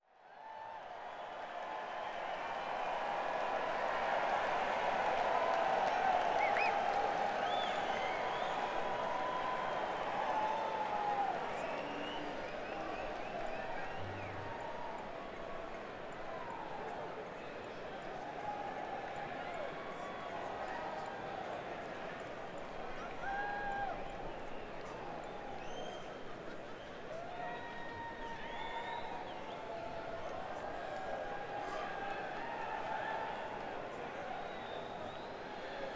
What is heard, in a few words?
Crowd; Las Vegas, NV - MGM Grand Garden Arena